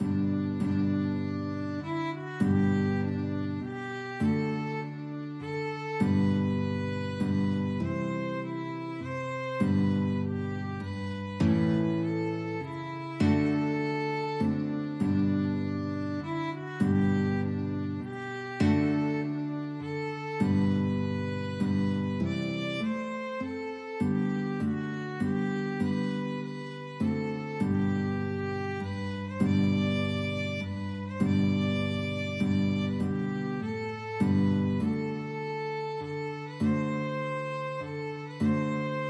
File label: god glory violin guitar